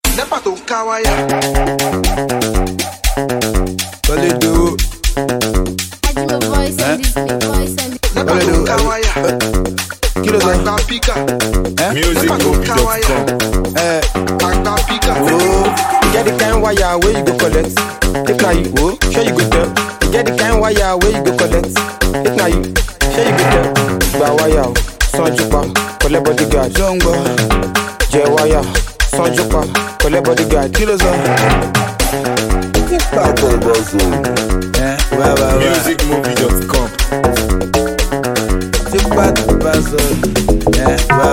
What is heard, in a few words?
Dance Riidim